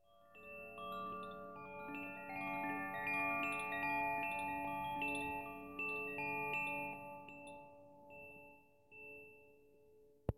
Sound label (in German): Koshi Wasser